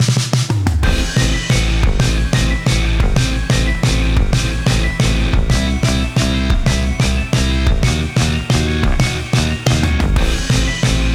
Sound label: Big Boss Battle; club penguin island original soundtrack